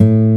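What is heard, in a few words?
A 1 OVATION, acoustic guitar; AKAI S6000 CD-ROM - Volume 1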